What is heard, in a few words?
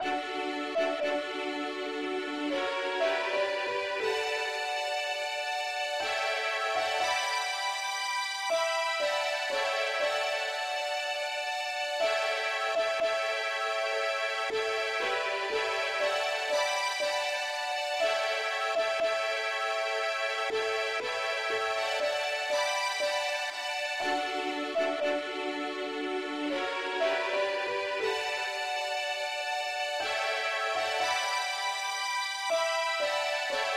uke lead key d